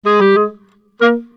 c min clarinets; PowerFX - Downtown Orchestra; Orchestral arrangements

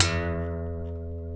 F#, funk guitars

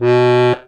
STEIRI B 0, STEIRISCHE

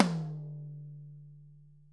ROTOTOMS; Sonic Reality - Vintage Timetraveler

